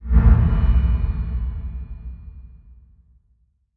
Bass Impact Dreamy

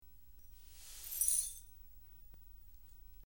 Light Sweeping Up Gla, cleaning, sweeping

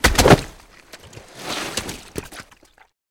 Body Falls Mud Heavy

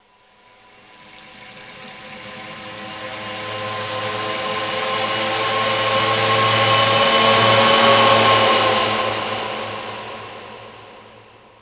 Torpedo Jet By, torpedos

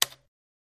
Computer Keypad Single Key Stroke

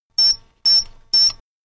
Alarm Synthetic; hi-tech various

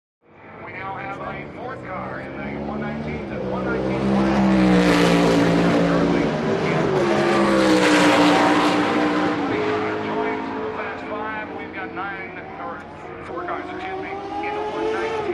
Sports Car Race Pit

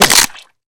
Groin Crunches Short, flesh

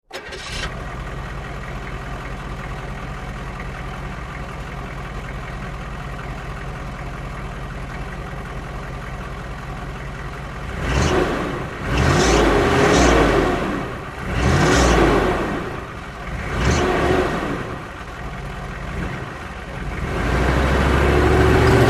Humvee: Fast Start, Idle And Away.

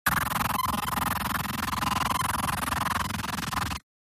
Digital Readout, digital

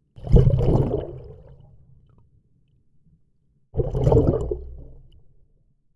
Underwater dive movement

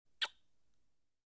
Pucker Lips And Kiss; Blowing A Kiss. - Kiss

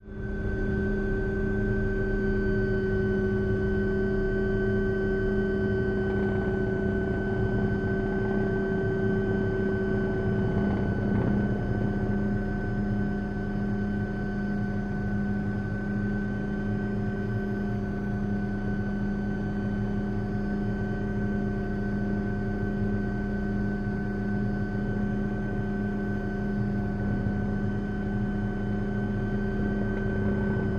OH6 Loach Interior, little bird

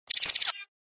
Flash Gear Elect Ratt, flash gear

